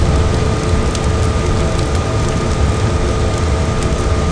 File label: melter loop